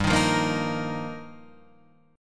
G Minor 7th Sharp 5th, Guitar, Standard; strummed; 3,6,3,3,4,6; JGuitar

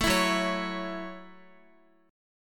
Gb Minor 7th, Guitar; Gbm7; strummed; x,x,9,9,6,9

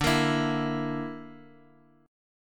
D Suspended 2nd Sharp 5th, Guitar, Open D

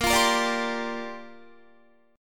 Bb 6th Add 9th Bb Guitar; Bb6add9 strummed

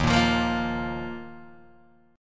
D sharp Suspended 2nd, Guitar, DADGAD

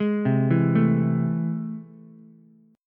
A Minor Major 7th, down, Ukulele; arpeggiated; AmM7; JGuitar

